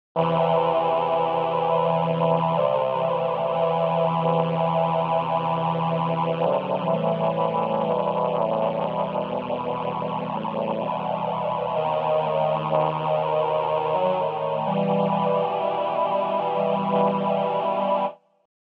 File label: Male Ensemble